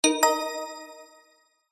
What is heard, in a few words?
mobile game warm collect item